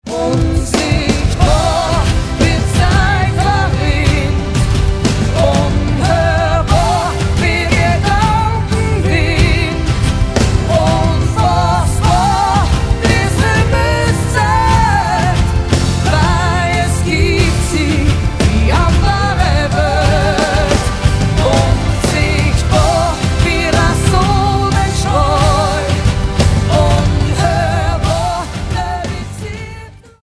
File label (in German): Duett